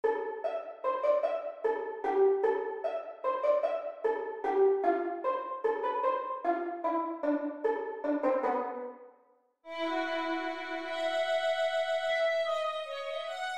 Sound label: assez vif violin